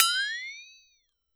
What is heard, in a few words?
flexi up; E-MU Producer Series Vol. 7 – Old World Instruments